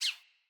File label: Bird Single Chirp; Josalfar Bird Guide